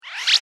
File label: Supersonic Fly By, spacecraft sound effect; science fiction sound effect